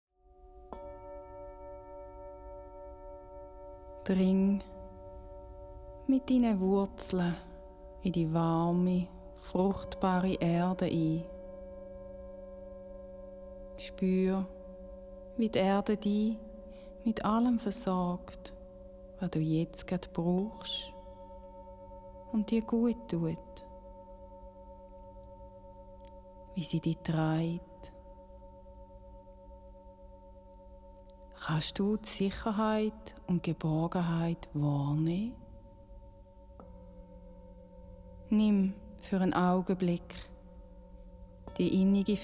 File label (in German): Erbluehen mit Klangschalen